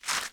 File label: Footstep gravel